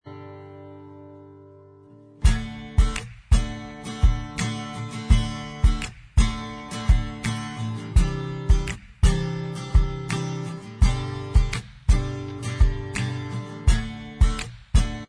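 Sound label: acoustic style, instrumental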